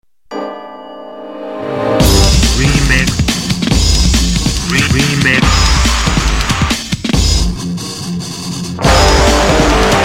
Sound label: metal, ringtone